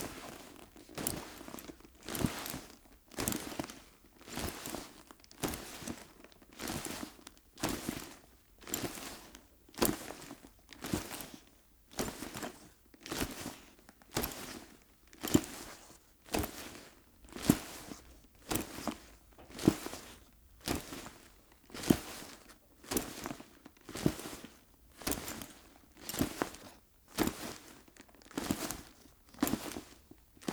PURSE LEATHER SCRAPE; Joshua Reinhardt; Ultimate Cloth and Prop Collection

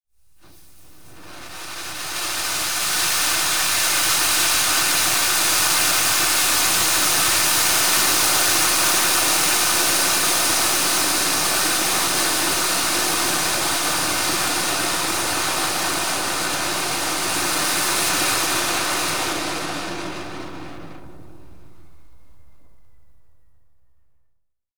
Fireworks, Chrysanthemum Fountain; BBC Sound Effects Library; Explosions Guns Alarms

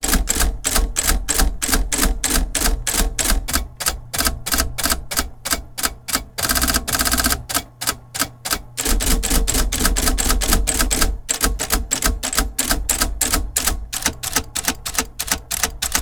Typewriter key sampling; oktava; SkewSound; QWERTY

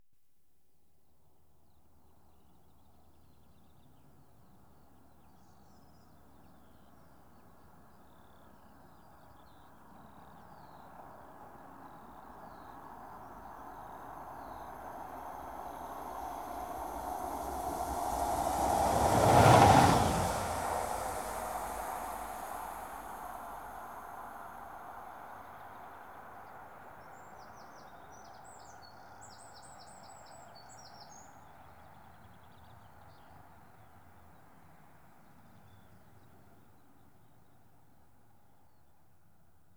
Trains Passing Single diesel; BBC Sound Effects Library

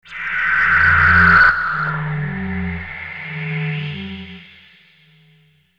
Aliens Monsters; FXHome

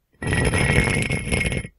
stone Drag; Kenney's Sound Pack; Foley Sounds; Rocks

